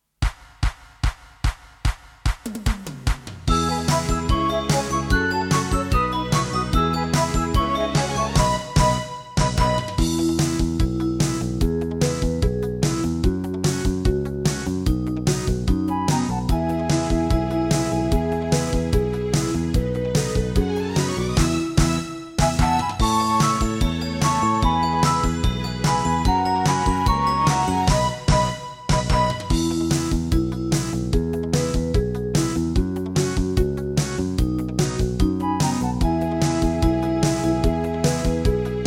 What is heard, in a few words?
karaoke only